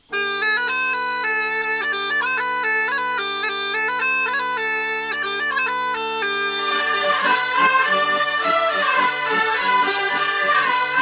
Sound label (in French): bourree, danses; La bourree de Sarzay